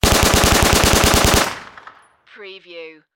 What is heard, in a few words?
Submachine Gun Sten